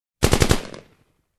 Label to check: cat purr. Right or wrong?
Wrong